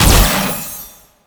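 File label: Kunai Projectile Explosion, Weapons; Warframe; Choir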